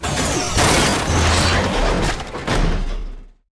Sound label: melee chest punch; Titanfall 2